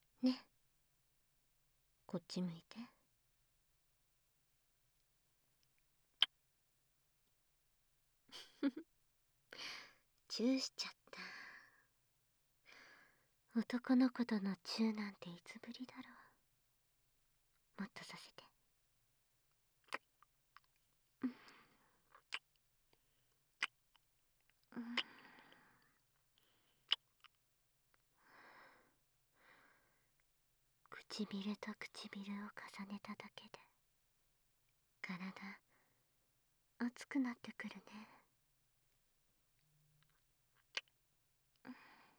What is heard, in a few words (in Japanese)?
ふわふわキス; SE音無